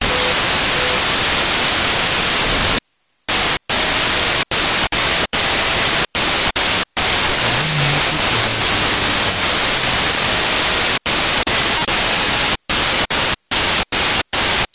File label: 8 bit game 110 bpm